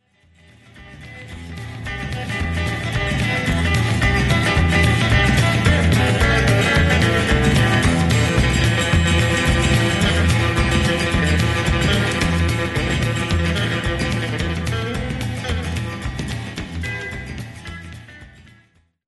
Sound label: rhythm